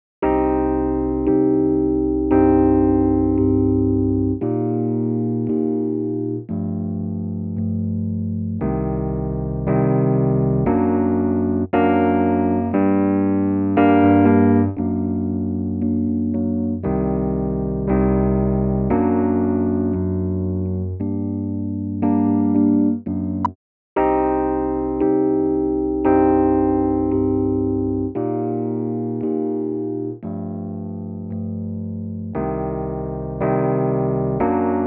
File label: Golden Age EQ 73 MkII E Piano